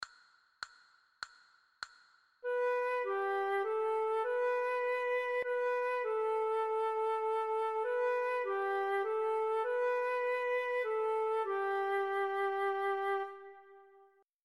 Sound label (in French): Chant du bearn flute, flute a bec; methode pour apprendre a jouer